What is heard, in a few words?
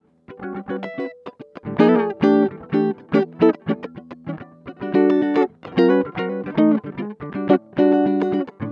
110 NuJazz Guitar Emaj, Jazz Guitar Loops; Pro Tools Essential Loops; Digidesign